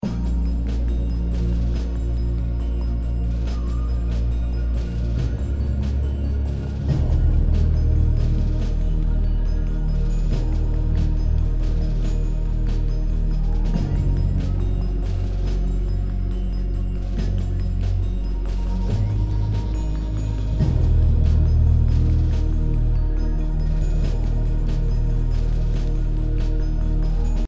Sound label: Menu Anti Action